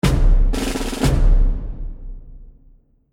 Civil War Drums Stinger

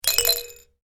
toy musical shaker; Miscellaneous Toy; Toys; McKinney Sound

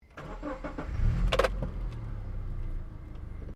CAR SUBARU LIBERTY INTERIOR IGNITION; Subaru Liberty 20 01; Family members Subaru; Vehicles

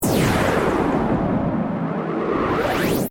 Hit Distortion; Sound Design; BlastWave

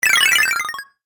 Game App Drop Coins, Video Game Sound Effects

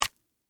Stab Apple Hit, Texture, Stab, Apple, Hit, Fruit; Food; Household

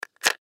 Chalk Box Open; Playground; Backgrounds; Foley; Playgrounds; Blastwave

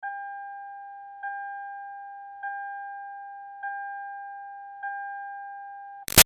Car Highlander Chime; 2005 Toyota Highlander V6; Seatbelt Chime; Cars; Vehicles; Big Room Sound

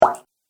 Goop Sound, cartoon; Cartoon Sound Design Sound Effects

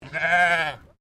Animal Sheep English Leicester Baa

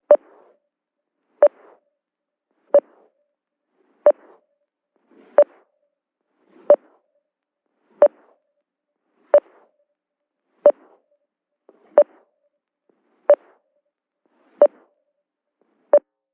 Police Radar Beep; Emergency Vehicle Sound Effects

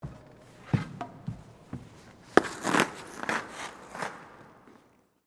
Hockey Bench, Player, Leave, Bench, Enter, Ice; Sports; BlastWave

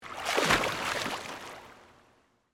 Pool Indoor Splash, Water; Pools; Backgrounds; BlastWave

